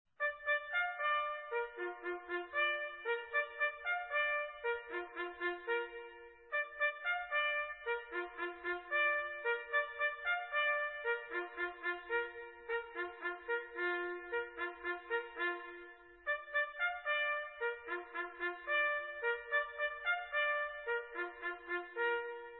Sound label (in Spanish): corneta, infantes